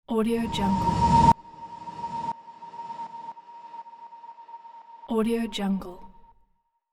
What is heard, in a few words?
transition airy sweep long delay; transitions and movement